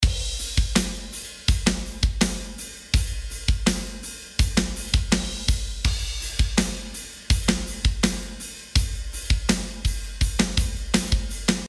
drums before; puigtech

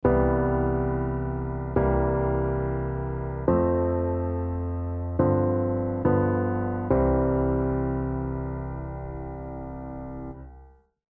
Chord

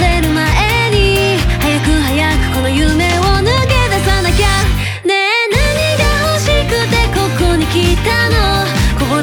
Bass fill

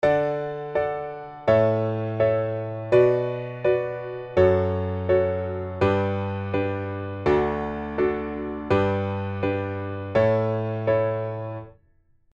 kanon chord

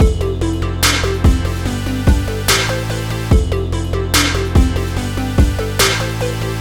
Wavetable

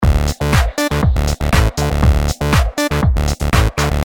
synth oct

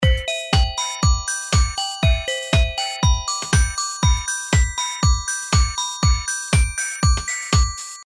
synth recipe

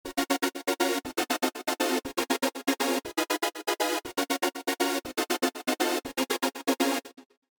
Trance Gate

